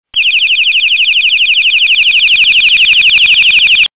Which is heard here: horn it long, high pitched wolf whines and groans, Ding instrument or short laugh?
horn it long